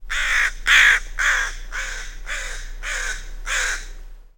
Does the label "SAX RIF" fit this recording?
No